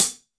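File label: closed hi hat, Roland BK-5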